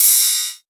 Ride, Cymbals

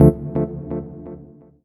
Synth Shot D

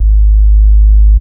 Bass Shot G#; Mutekki Media; Vengeance Electroshock Vol.2; Bass Shots

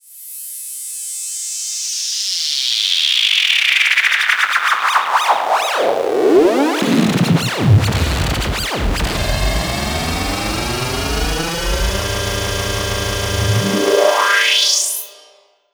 FX Misc; Mutekki Media; Vengeance Electroshock Vol.2

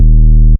Bass Shot D#

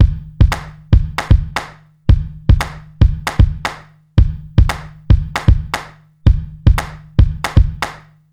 115 Clap On Off, Breaks; loops; 115 BPM; Loopmasters; Jon Carter & Alex Blanco; Block Party Essentials

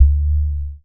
Bass Shot D; Mutekki Media; Vengeance Electroshock Vol.2; Bass Shots

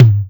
Toms

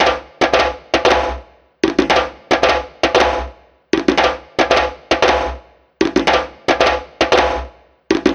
Breaks, 115BPM; Maintenance

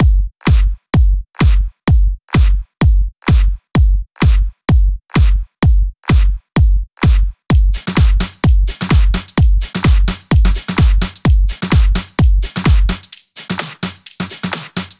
Electro House Beat; Mutekki Media; Vengeance Electroshock Vol.2

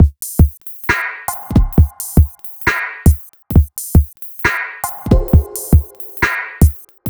Bpm135 Stoned Break, Dubstep Drum Loops

